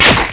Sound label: spear